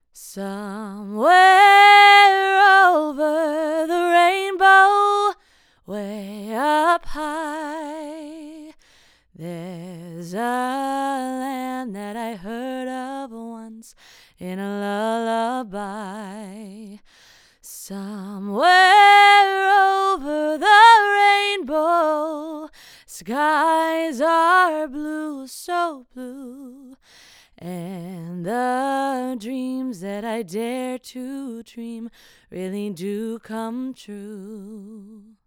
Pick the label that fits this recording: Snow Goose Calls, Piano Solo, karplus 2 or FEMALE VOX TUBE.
FEMALE VOX TUBE